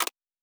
UI Click; Fantasy Sounds Bundle

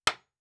Nail; Fantasy Sounds Bundle; Craft

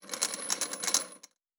Chain; Fantasy Sounds Bundle; Craft

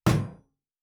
Shield Metal; Fantasy Sounds Bundle; Medieval Combat Sounds

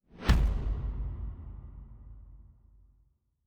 Special Click, Fantasy Interface; Heroes of Hiis; Fantasy Sounds Bundle

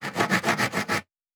Saw Wood; Fantasy Sounds Bundle; Craft

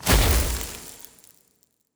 Ice Spell, Magic Sounds; Fantasy Sounds Bundle

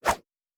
Whoosh; Fantasy Sounds Bundle

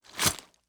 Stab; Fantasy Sounds Bundle; Medieval Combat Sounds

